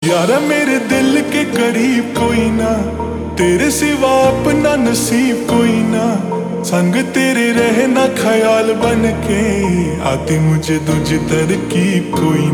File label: Slowed Reverb; Bhool Chuk Maaf